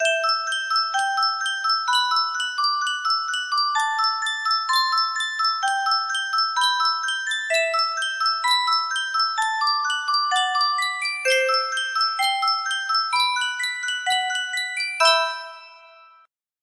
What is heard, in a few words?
yunsheng spieluhr, music box melody